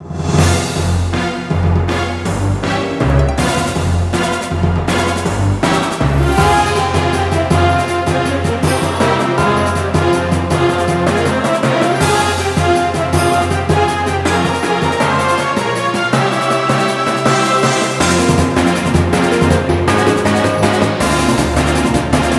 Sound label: Riff Boss; tangledeep linux macos ps4 switch windows gamerip 2018